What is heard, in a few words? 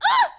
girl grunt; female characters; AoM-ARTofMyth